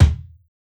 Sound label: KICK